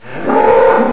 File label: attack, baby panther; svencoop; custom monsters